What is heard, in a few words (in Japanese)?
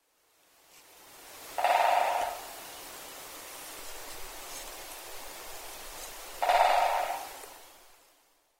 ezoakagera, shiraoi, koe